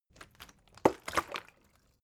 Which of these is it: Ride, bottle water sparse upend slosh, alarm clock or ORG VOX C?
bottle water sparse upend slosh